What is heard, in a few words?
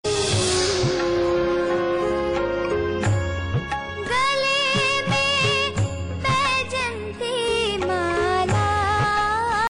Funny Indian Sms